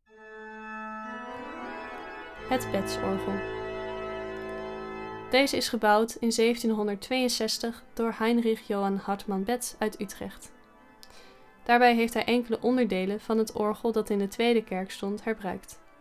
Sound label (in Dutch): het orgel